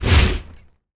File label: attack